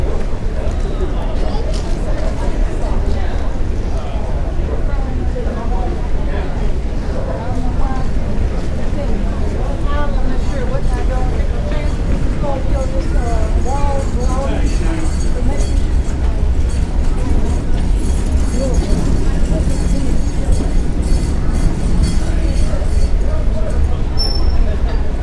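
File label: subway station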